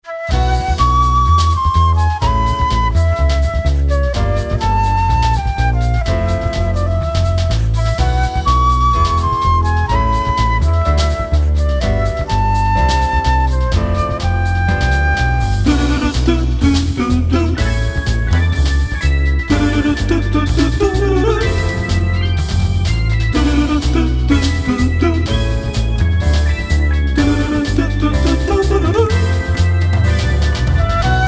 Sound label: lounge music, mondo lounge